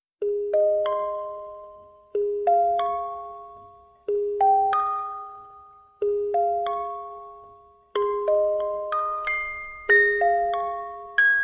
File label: rockabye